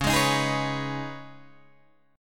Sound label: C sharp 7th Suspended 2nd, C sharp, down, Guitar, DADGAD